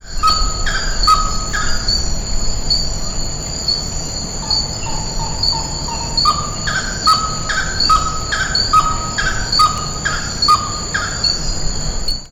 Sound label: Aramides cajanea; Pr Tangua; Ang dos Reis, RJ